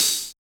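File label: Hi-Hat Open; Yamaha DJX-2B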